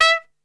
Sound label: E 3 X PIC SHT, Brass; Best Service; Brass Super Section